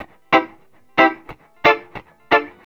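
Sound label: reggae electric guitar G; Best Service Advanced Media Trax Modern Composer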